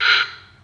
kraak; hooligan

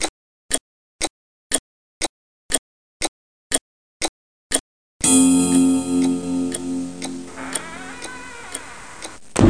Klok; Play&LearnRC; Wolf Geitjes

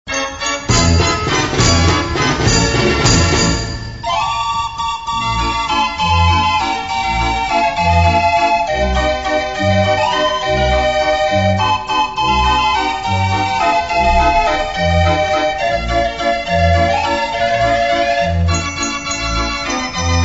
draaiorgel; The Netherlands

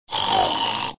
snurk

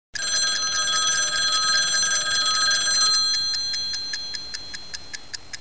Wekker; Play&LearnRC

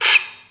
kraak; hooligan

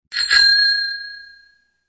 Fietsbel

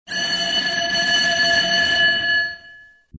schoolbel

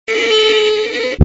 viool A

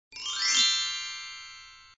goed sound

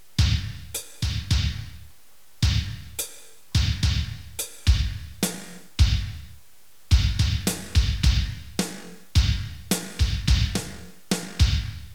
ritme

